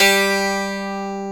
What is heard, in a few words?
G-1 ON, MINI 12.GTR; AKAI S-Series CD-ROM Sound Library VOL-7